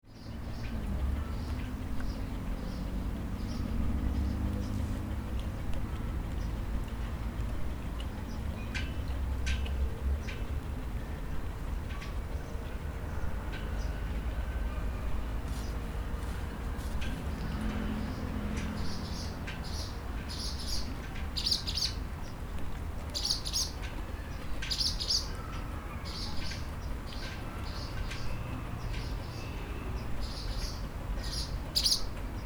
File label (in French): territoires inondables IJBURG description sonore